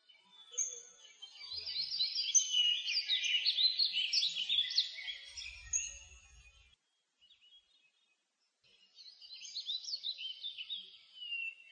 ambient bird; game rip; mabinogi

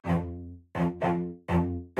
Cello

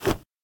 Weapon Flag Start

